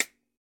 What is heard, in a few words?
button